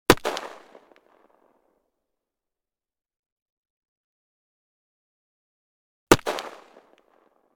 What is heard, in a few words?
gun tikka; still north media